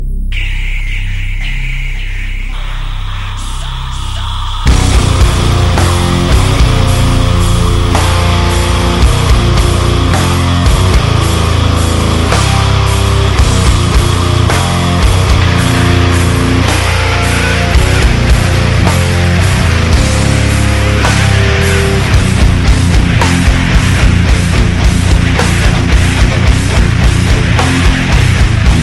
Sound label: Metal Francais